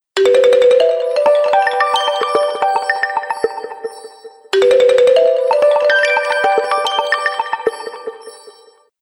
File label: Soft beems; Samsung SCH-M710 T Omnia II